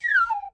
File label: Zoom Out